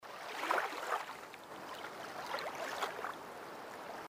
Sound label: Fishing Water; LG GD900 Crystal; Game Sound